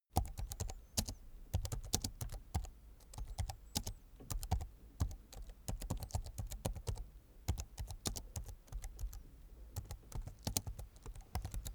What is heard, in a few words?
keyboard laptop type sequence slow soft